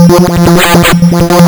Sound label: lsdj waves; wavetables